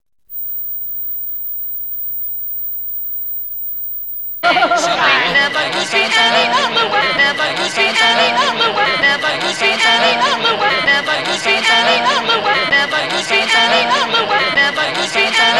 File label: Dog Whistle And Run Out Groove